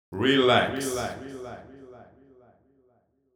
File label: deep house male, 125, A, vocals